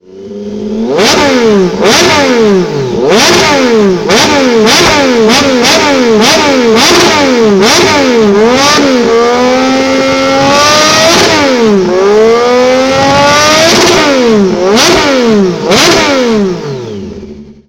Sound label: Triumph Moto 2 765